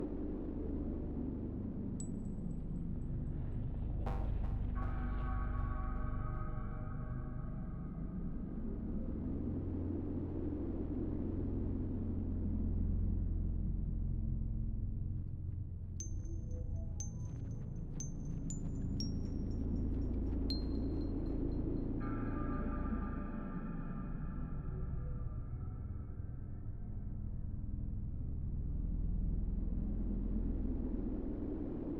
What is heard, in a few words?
Dark Cold Main Menu Loop; Mild Mountain Sickness; Marb7e